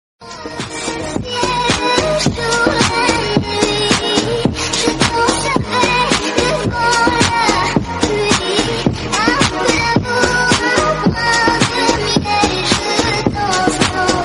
villain bgm ringtone; joker

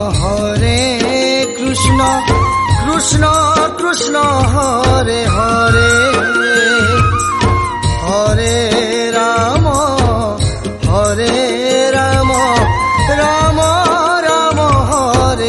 hare krishna hare krishna ringtone odia

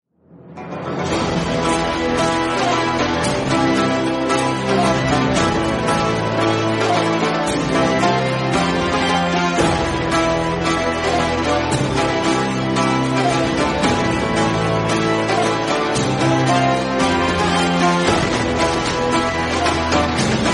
bgm ringtone; kgf

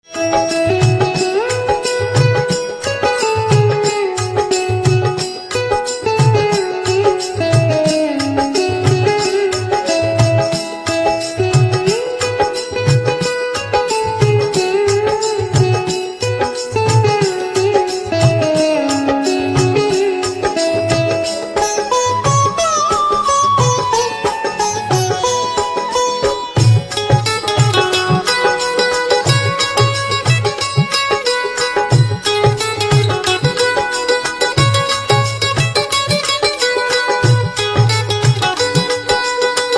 instrumental ringtone

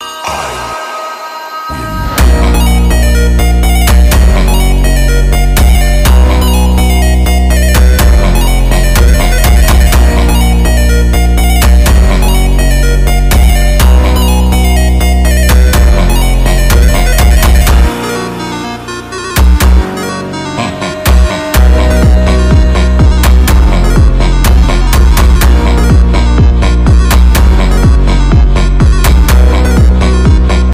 phonk ringtone

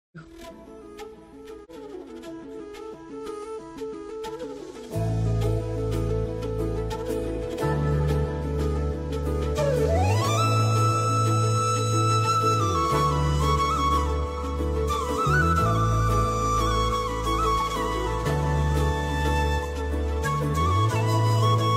flute ringtone

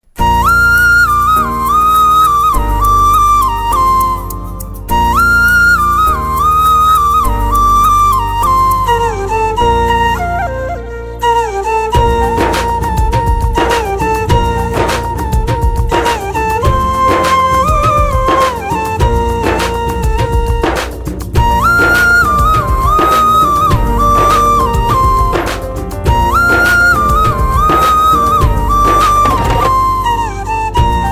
flute ringtone

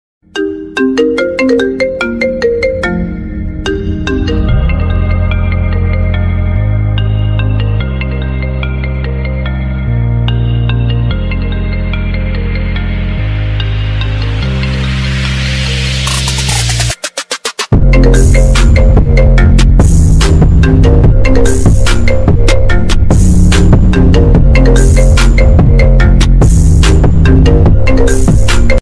iphone ringtone remix ringtone

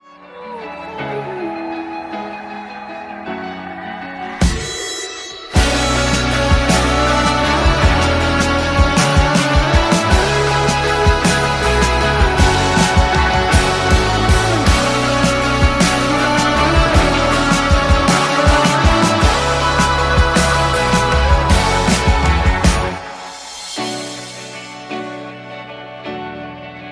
ringtone instrumental

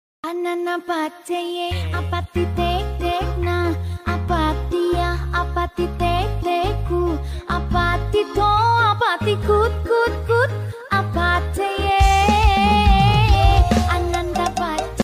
Song Ringtone

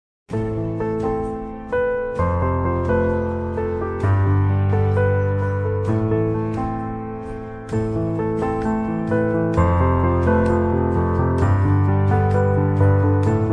ringtone flute instrumental

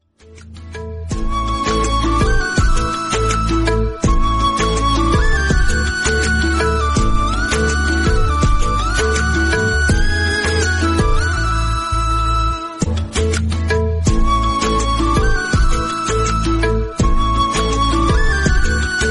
instrumental ringtone

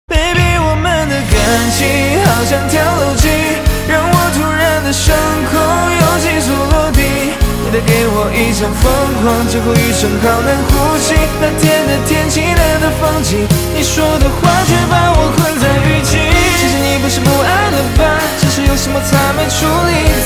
ringtone

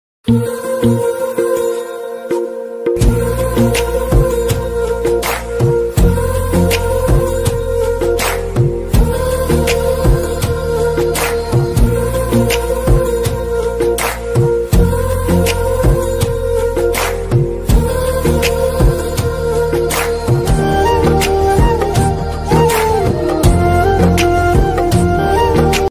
instrumental ringtone

